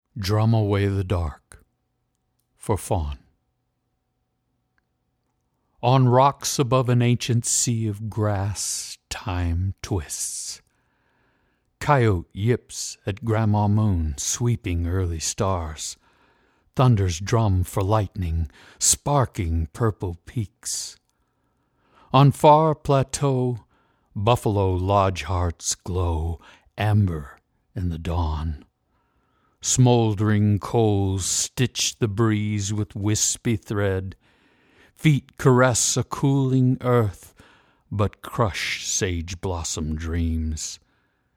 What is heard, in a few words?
Drum